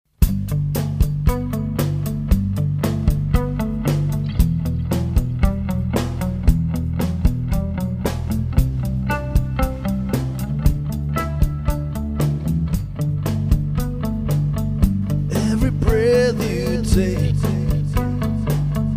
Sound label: breath